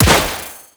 Pistol Impact Generic; Sentient; Crp Sent Amalg Pistol

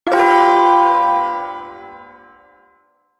Ersatz Shawzin Maj Chord; Warframe; Duviri Ersatz Shawzin

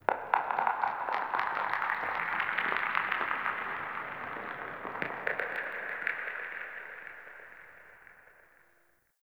Rail Jack Exterior Earth Crackling; Ambience; Earth Region

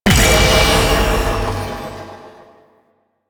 Buff Ability Cast; Warframe; Duviri; Duviri Dax; Abilities; Ashigaru

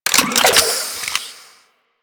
Torpedo Pistol Reload, weapons; Grn Torpedo Pistol

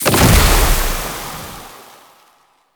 Grenade Explosion Toxin; Warframe; Entrati; Alchemy

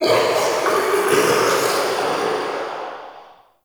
Crypt Spirit Vocal Death; Enemy; Champion Crypt Spirit

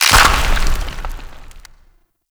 Large Rock Crumble A; Ambience; Warframe; Grineer

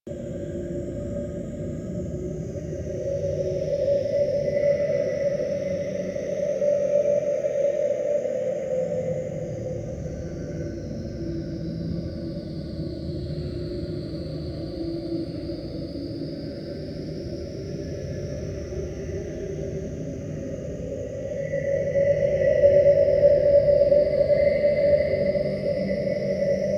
Interior Orokin Bed Loop, Ambience; Warframe; Infested Eris

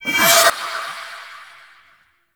Heavy Scythe Blade Accent; Melee; Duviri

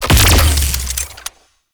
Shot Heavy, Weapons; Warframe; Archwing; Larkspur Prime

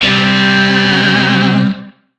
Shawzin Chromatic Chord A; Music; DE; Warframe; Lizzie Shawzin

